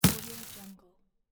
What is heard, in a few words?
scifi plasma projectile impact